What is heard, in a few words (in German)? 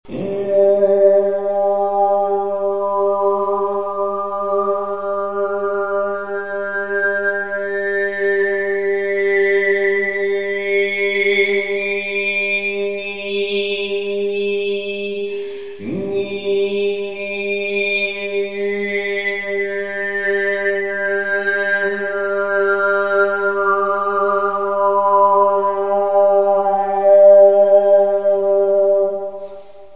bird gong vokal kreis